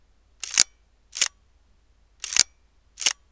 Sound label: quiet, Nikon D7000